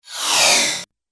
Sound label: Astral Engines Sweep, whoosh; Andrew Fleming